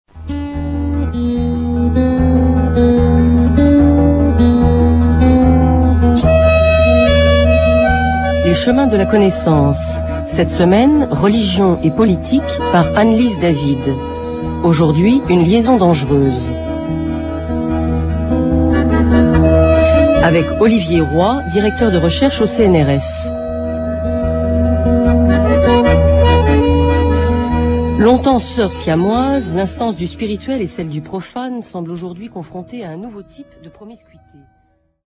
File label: jingle